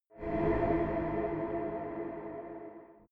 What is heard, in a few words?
space shuttle signal low; raumschiff